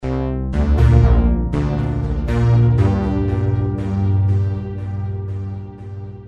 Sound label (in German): slow bass sequenz